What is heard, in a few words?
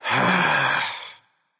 nv sigh; smite; voicepack; mercury